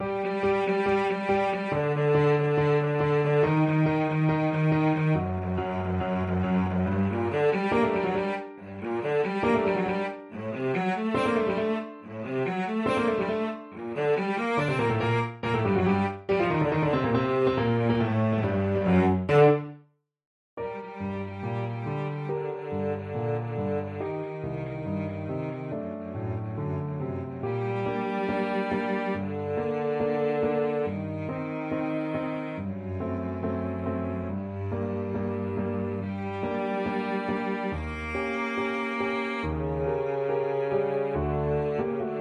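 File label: violoncello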